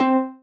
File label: marimba rainbow